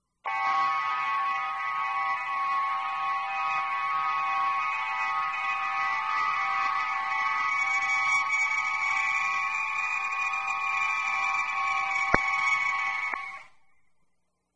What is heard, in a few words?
materialize